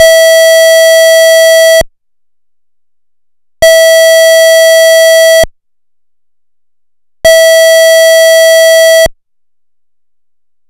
Interrupted Sweden, Sweden SS031711 (air raid warning); Interrupted tone; pfannenberg; signaling-technology; combined-signaling